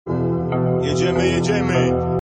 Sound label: Bonus Sms